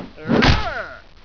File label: ugh